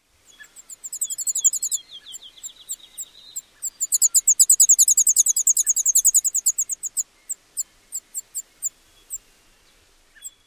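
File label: rapid call series, Field Sparrow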